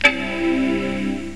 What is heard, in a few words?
mac start; mactheme